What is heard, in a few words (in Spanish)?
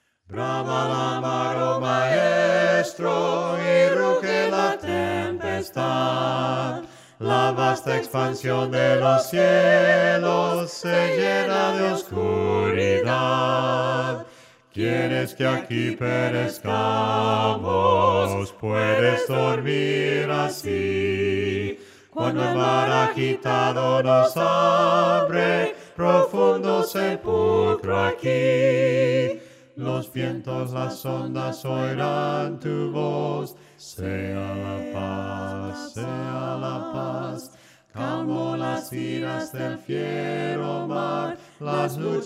Sea la paz melodia palabras sin instrumentos